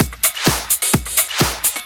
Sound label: Clubhouse Loop 128 BPM